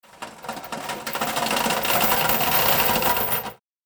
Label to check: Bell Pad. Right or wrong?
Wrong